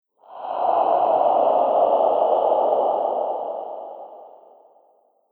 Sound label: Gruesome Ghost Monster Discharge; Sinister, Scary, Spooky Or Just Silly; Ghost Sound Effects; Haunted House; Andrew Fleming